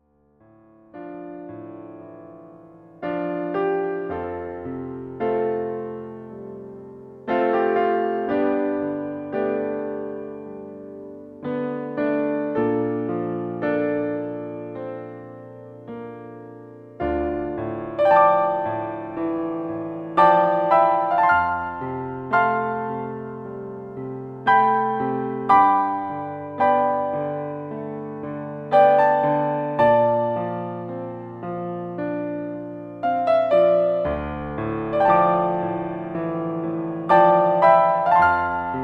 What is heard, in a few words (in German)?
Klavier